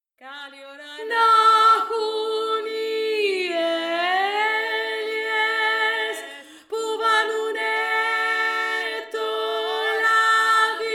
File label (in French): VOIX 3